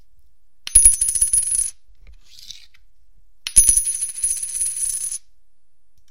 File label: silver quarter, Silver 1962 quarter; rare; hiding amid your vending machine change